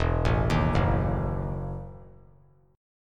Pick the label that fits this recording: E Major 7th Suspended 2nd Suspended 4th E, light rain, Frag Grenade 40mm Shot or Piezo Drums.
E Major 7th Suspended 2nd Suspended 4th E